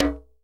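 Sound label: low bongo; Cuba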